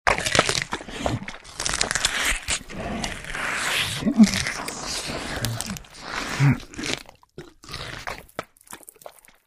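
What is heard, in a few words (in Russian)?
монстр ест плоть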